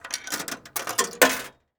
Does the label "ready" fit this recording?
Yes